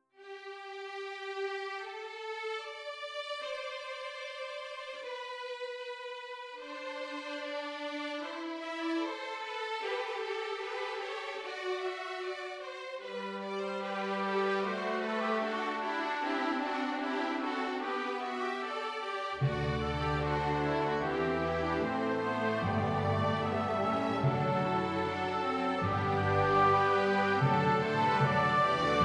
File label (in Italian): Frammento di una fuga. Orchestrazione; di Gerd Prengel